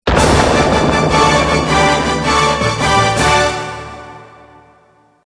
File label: Fun Zone Start, pinball music; 3D Ultra Pinball Thrillride